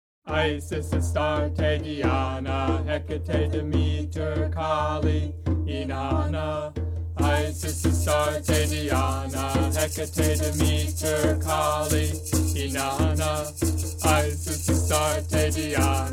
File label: Chants Goddess Medley